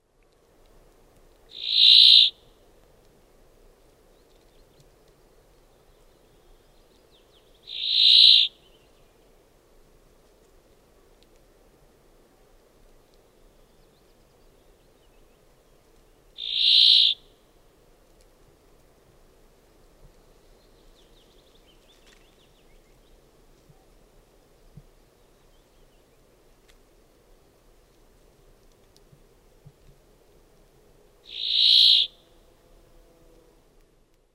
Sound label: brambling